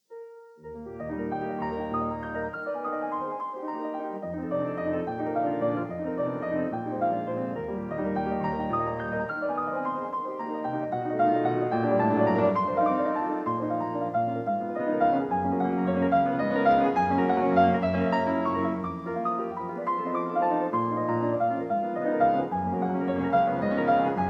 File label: Prelude No. 19 in E flat major; F. Chopin; 24 Preludes, Op 28